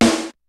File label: KICK SNARE; Kid Nepro; Elite; KICKS SNARES